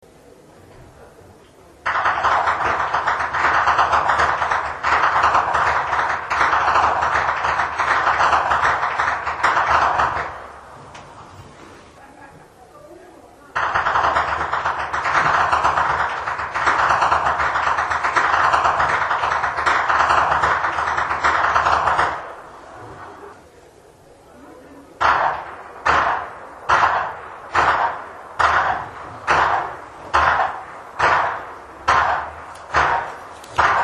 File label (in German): schnalzen, flachgau; muntigl